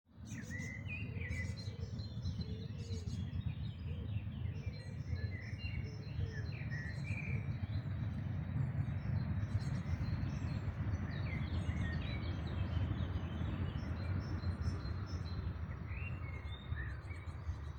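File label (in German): Dawn Chorus, Vogelgesänge vor Sonnenaufgang; Dawn Chorus 2025; Kohlmeisen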